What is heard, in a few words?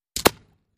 Bullet Impacts Water